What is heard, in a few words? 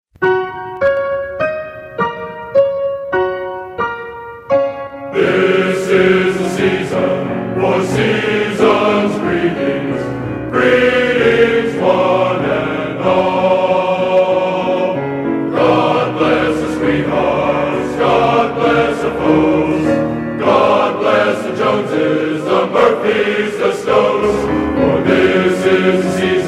Christmas, Men's Glee Club; Seasons Greetings; USCHS Men's Glee Club -Pgh